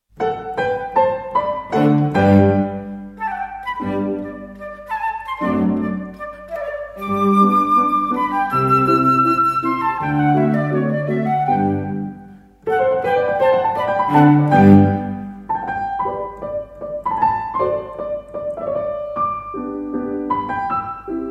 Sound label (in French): trio pour flute; Haydn